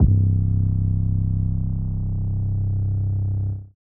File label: sub bass 32 C1; ESW Floom V.1